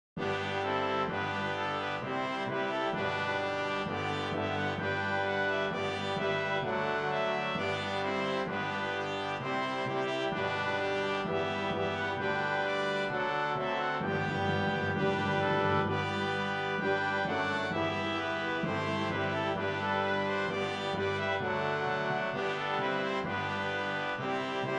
Brass

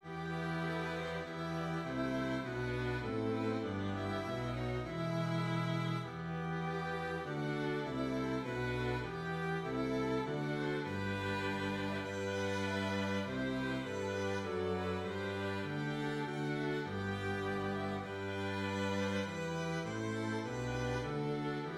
Hymn Strings; When Christ’s Appearance Was Made Known, or Erhalt uns, Herr

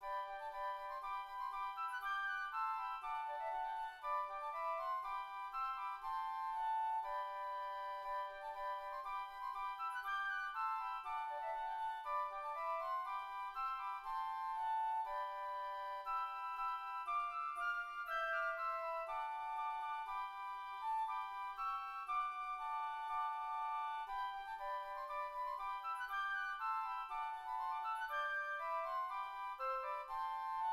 Hymn Flutes

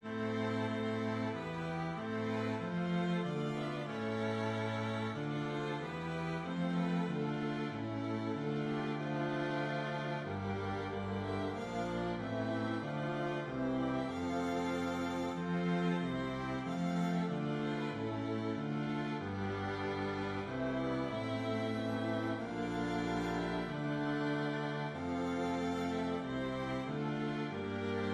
Strings; Hymn; Christ the Lord is Risen Again (Christ ist erstanden)

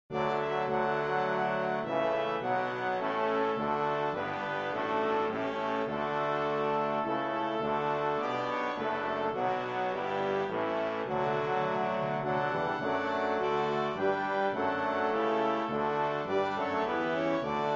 Hymn

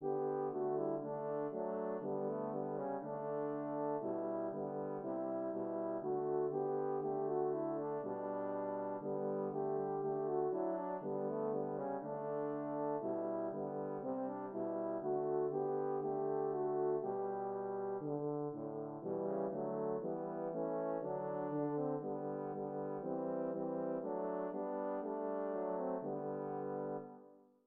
Duo Trio

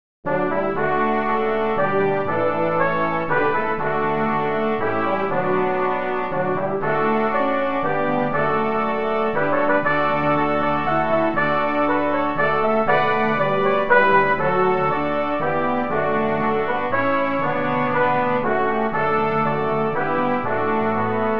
Hymn

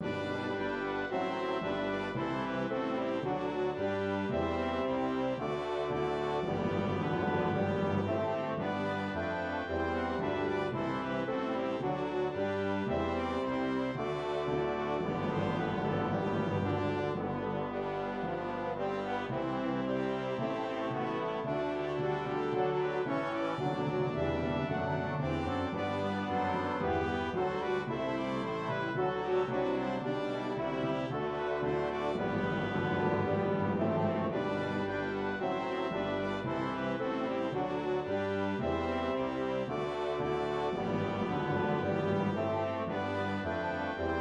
Brass; All Things Bright and Beautiful (Royal Oak)

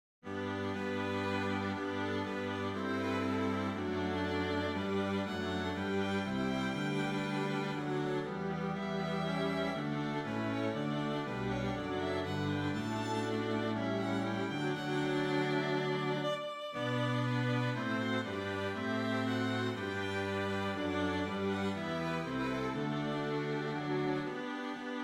Hymn Strings

